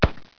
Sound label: MUSKET; 枪炮声音素材